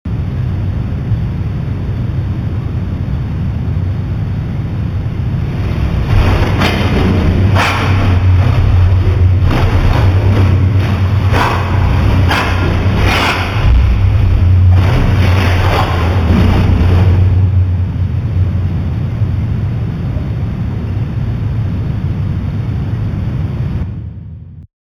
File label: Signal Evil Audio